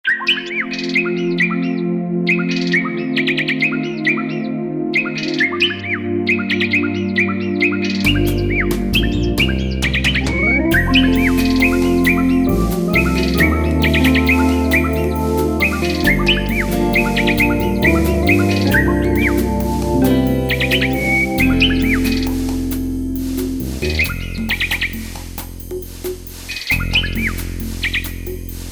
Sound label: Nightingale Jam